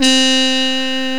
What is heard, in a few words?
muted trumpet, brass